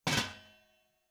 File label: pot lid close, kitchen